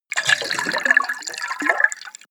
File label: splash and drip, Badezimmer; haus garten